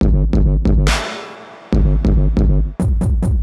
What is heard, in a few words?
Beat - Kicks & Snare, 140bpm; Zero-G Sound Sense Grime Scene; GS Construction Kits; Just Flex